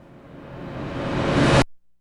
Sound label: IMP RUSH, Cinematic Impact; M-Audio Pro Sessions; Liquid Cinema